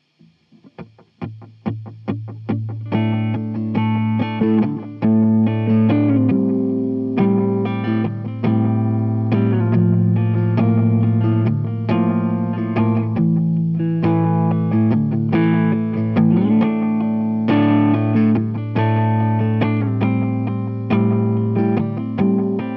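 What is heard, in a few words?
D 140 guitar groove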